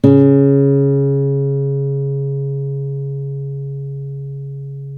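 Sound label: acoustic finger C#2, guitar; East West; Steve Stevens Guitar Samples Collection; ACOUSTIC FNG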